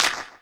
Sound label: Clap, Hip Hop